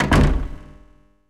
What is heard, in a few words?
Door Shut; Super Smash Bros